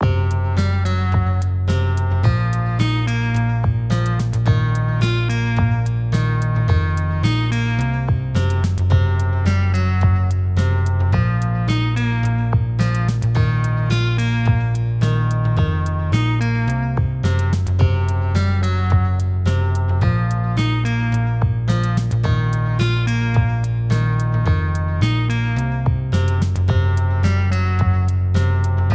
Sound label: pedal steel classic bass